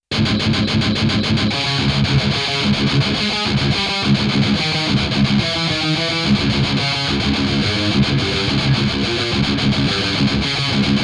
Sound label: TS-9 Mesa Triple Recto